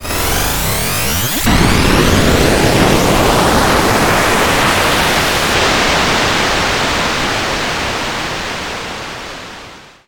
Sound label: energy lab explosion